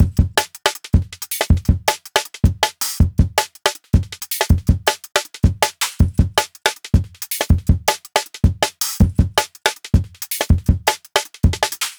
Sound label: 160 Live Jungle Drum Break; JULIUS DEANE